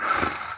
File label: snoring; birdo; sm64coopdx; char select extra chars